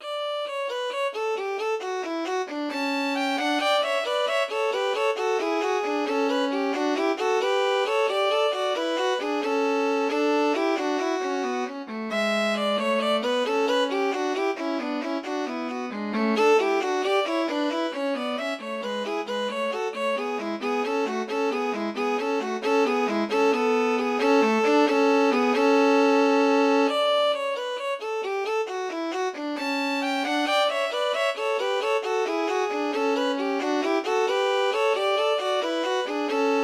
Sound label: violin; valentines day